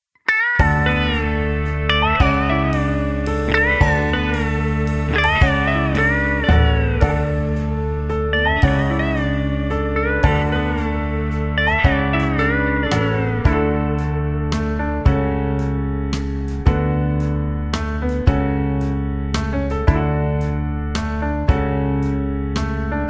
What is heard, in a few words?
D No Backing Vocals, backing tracks